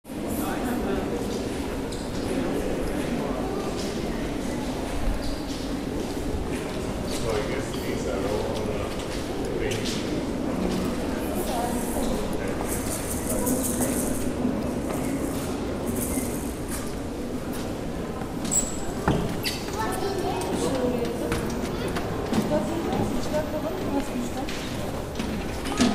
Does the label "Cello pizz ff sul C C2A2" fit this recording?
No